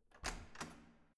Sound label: corridor door open normal